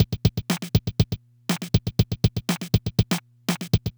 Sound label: Loop, dd-5